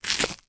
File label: toast snap tear